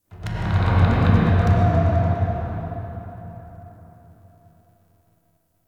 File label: Wooden Creak; Warframe; Ambience; Entrati